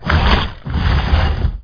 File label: worm bite